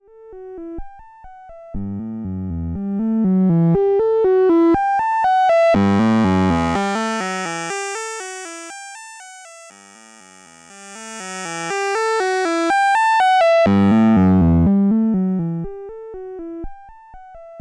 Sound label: vcf1 Drive BP sweep Gain 50